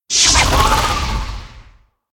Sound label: Projectile; smite; god susano